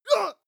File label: male attack shout short; schreie; menschen